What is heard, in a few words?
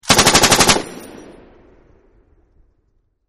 M240 Machine Gun Burst 3; M240 Machine Gun Burst 1; Machine Guns; VRSonic